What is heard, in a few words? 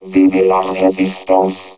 DROWN; droid